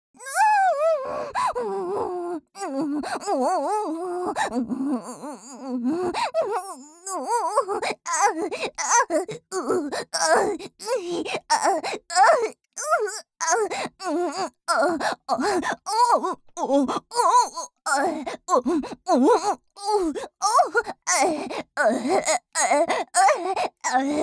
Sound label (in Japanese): 喘ぎ声